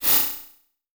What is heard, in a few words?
Multimedia Internet CD-Rom Flash Electronic